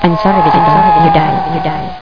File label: Player Die and Cry; Mac Rogue 3.0